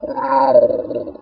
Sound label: Cheetah Growl